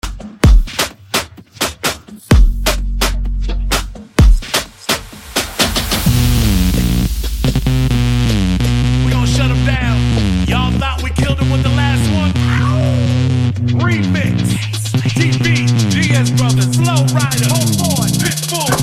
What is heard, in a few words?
Baile Funk; Mash Up